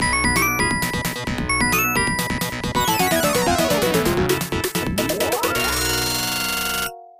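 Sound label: background music; Last Duel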